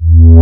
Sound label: warp